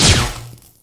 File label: plasma cutter